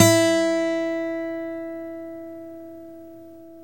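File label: GTR E4 AC F; Synclavier Sampler Library; KEYS AND GUITARS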